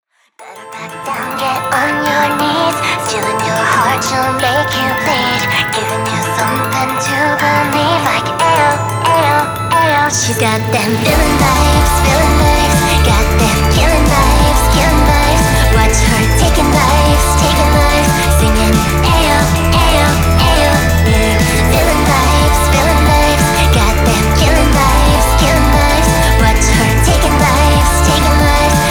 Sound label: villain vibes